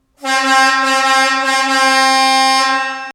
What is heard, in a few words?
Emergency Horn